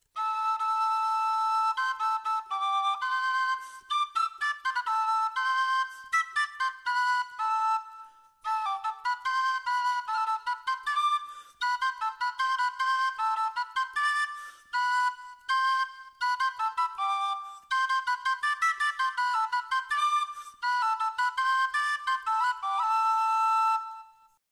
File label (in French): galoubet